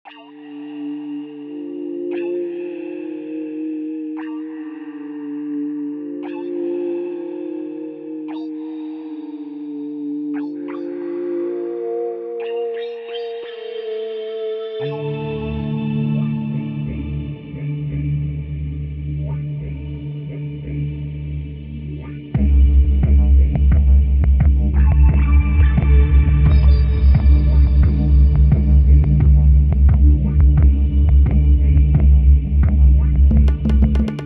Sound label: space frogs